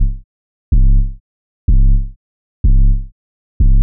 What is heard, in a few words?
125 Drum Kit